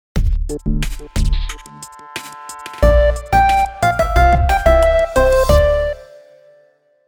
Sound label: sound logo ringtone; gaziantep city